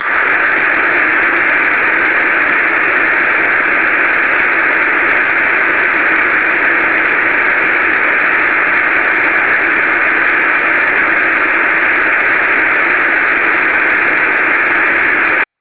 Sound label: noise